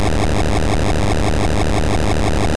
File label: Thunderbolt